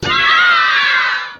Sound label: ah kids; Voice; ooh ahh